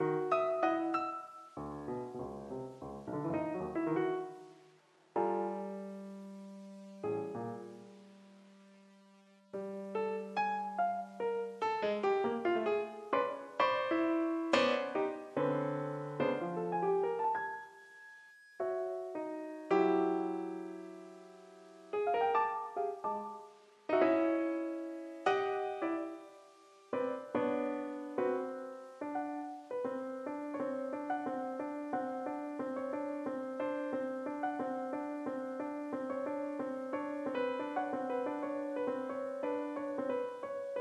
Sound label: Piano